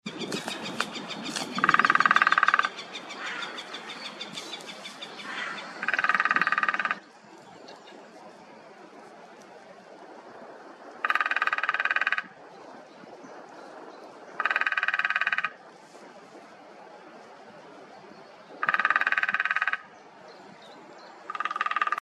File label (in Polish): dzieciolek